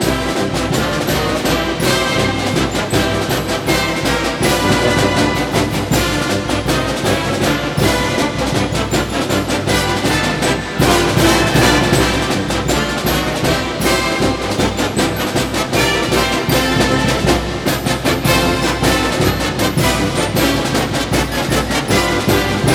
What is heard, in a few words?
Broadcast Fight Song; NCAA Basketball 09; PS2, PS3, Xbox 360